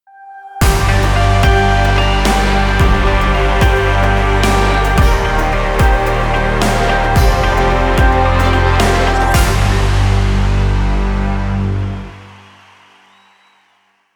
Music Awards 110bpm KEY g

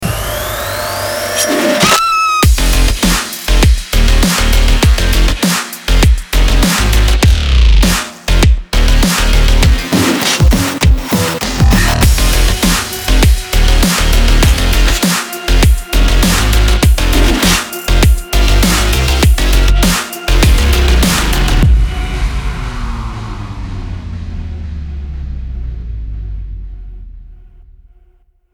Zombie Hunt 100bpm KEY f

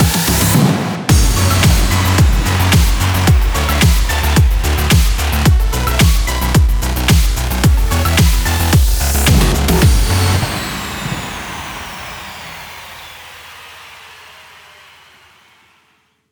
A Bigger Boom 110bpm KEY f#

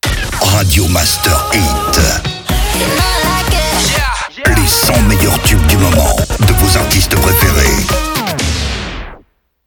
Jingle SLOW; Radio Master HIT

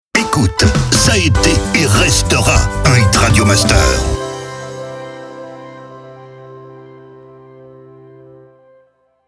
Jingles MEDIUM; Ca été et restera un hit

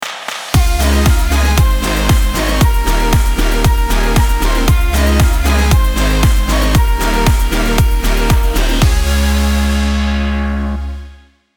Folk Dance River 116bpm KEY C#

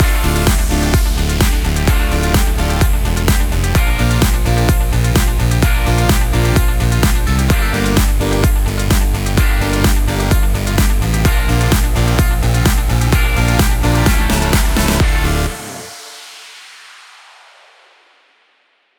Costume Party Contest Kit 128bpm KEY d A

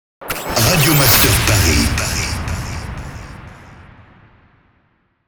Sweeper SLOW MEDIUM; Paris

